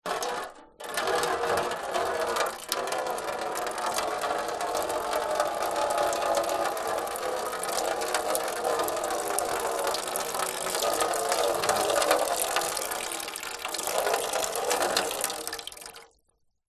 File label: nature water pour trickle onto thin metal